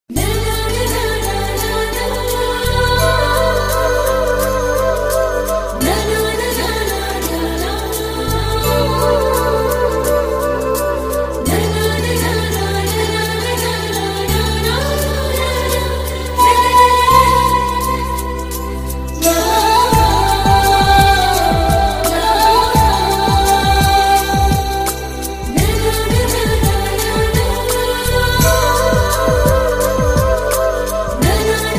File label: romantic odia ringtone